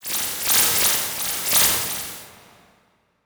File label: Transition Hit Impact Warble; Production Element